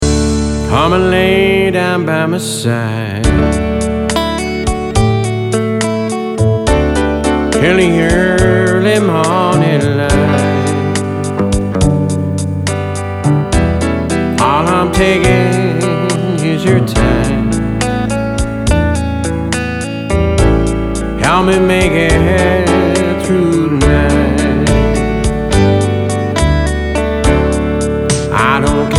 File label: ballad n blues